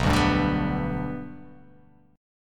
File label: B-Minor Sharp 5th